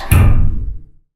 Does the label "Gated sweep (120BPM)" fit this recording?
No